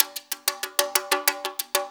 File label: 6-8 Conga Brush, Perc Loops; Drum Tools